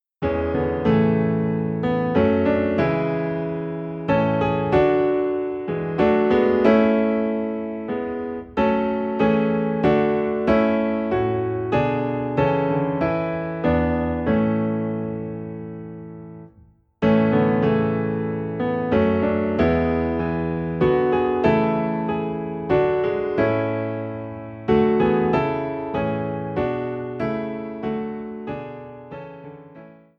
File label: Piano Low in A; The First Noel; christmas